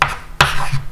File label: teletype; PubQuizKnockout